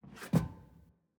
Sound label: Footstep Metal Rotate